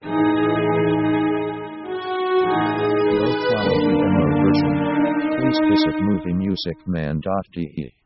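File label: orchestral strange mini sketch; strange themes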